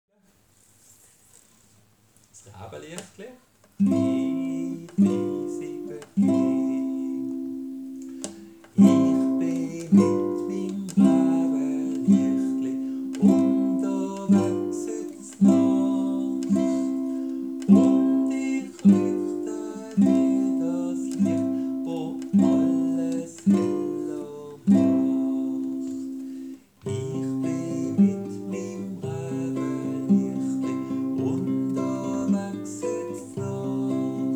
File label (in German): Mis Räbeliechtli Für Ukulele; Lieder in DUR; 2 Akkorde